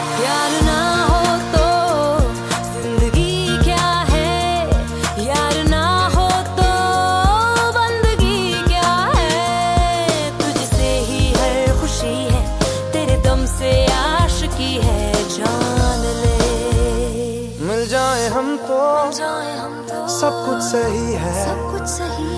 Female version Sad song

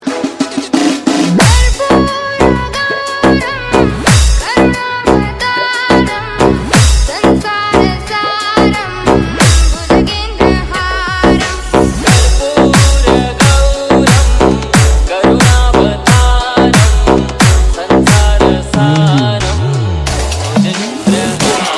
Ringtone

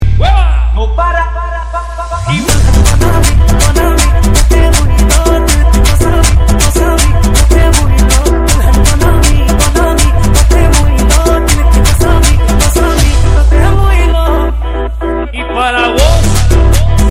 DJ Remix Song

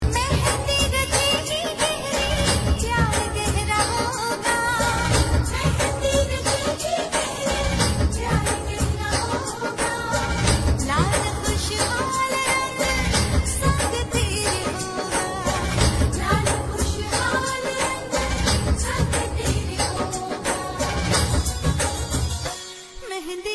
Wedding Song

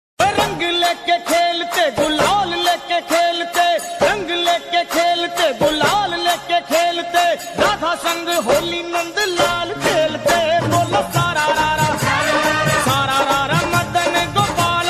Holi special song

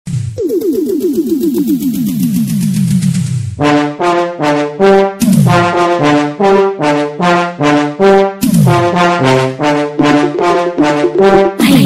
Viral Dj Remix

